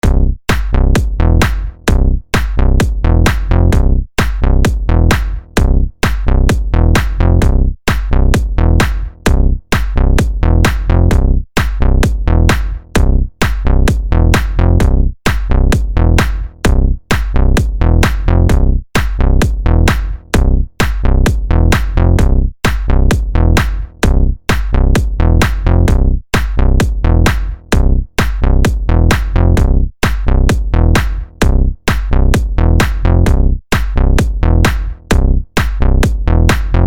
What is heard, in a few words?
base mega funk beat house bass; pack bases mega funk 2020